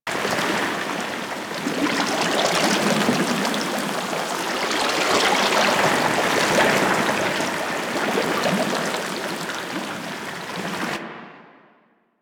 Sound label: Coolant Lake Loop; Ambience; Props; Loops; Warframe; Venus Open World; Venus Landscape